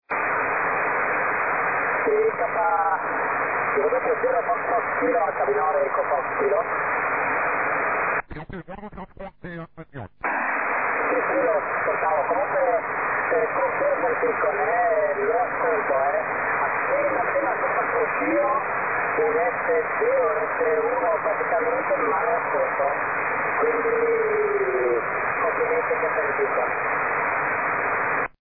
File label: BEACON; HB9EFK